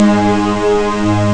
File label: Strings, Amiga Soundtracker; Amiga Soundtracker Sample Packs